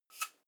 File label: herbs shake dull single; soundpool